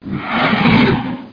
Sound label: hiss